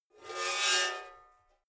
metal scrape rich short

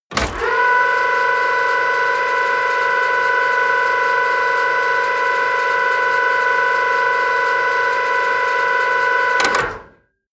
fire truck ladder rotate

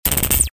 laser zaps

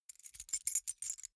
key ring small rattle

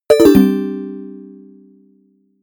notification bell

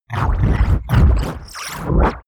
robot monster droid

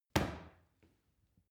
paw kick soft

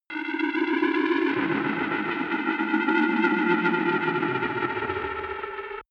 arcade dungeon

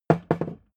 hammer wood bounce

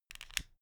stick lighter extinguish flame

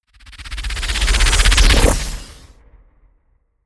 whoosh flutter

